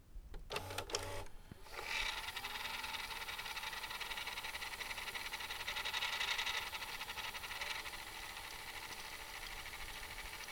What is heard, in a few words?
Brodcast VTR Tape FF, VHS; SFX; IRL Recorded